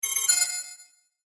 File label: Error Tone, Error Tone Multimedia; Web Interactive; Noise; Electronics